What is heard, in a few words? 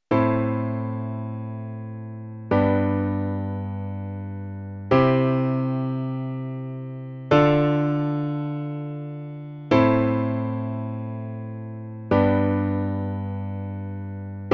Middle 8 in C example